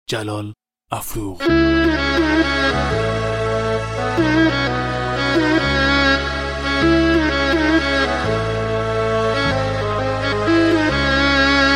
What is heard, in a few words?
Remix